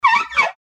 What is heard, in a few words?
little whale